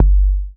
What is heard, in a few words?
BD ANALOG; Sound & Vision - Gigapack II CD 2